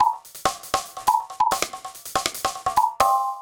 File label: Drum Fx Loop, Electronica